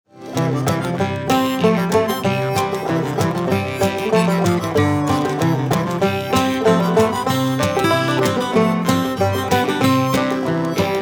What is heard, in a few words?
guitar fragment